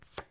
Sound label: slide; AfterStory; Doki Doki Literature Club